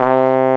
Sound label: BRASS C, pop brass; AKAI S6000 CD-ROM - Volume 1; BrassWind